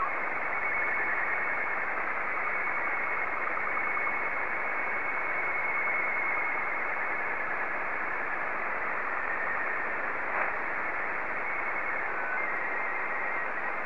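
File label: Robot 12 black and white, slow-scan television; JA3QJQC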